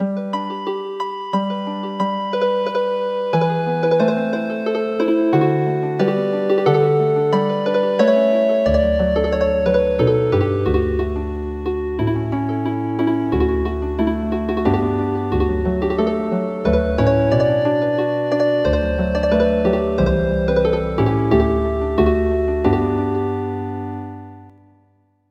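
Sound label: Healing Harp, midi traditional; You Our Ancient Land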